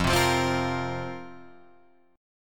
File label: F Suspended 4th F, Guitar Drop D